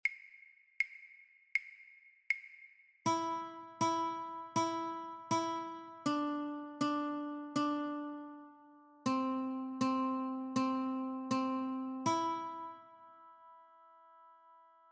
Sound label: Notes, guitar; christmas